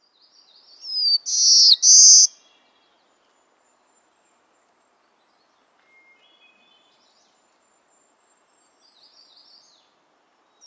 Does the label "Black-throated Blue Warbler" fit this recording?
Yes